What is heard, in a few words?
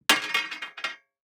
DICE on Metal, Throw and Roll, Standard, 1 One Die; Samples; ArticulatedSounds; DICE Sfx Party (Extended)